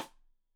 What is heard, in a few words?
Side Stick Stereo; Deeply Sampled Drums; SMDrum